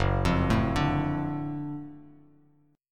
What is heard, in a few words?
Gb Minor Major 7th Sharp 5th Gb down Bass Standard; GbmM7#5 arpeggiated; JGuitar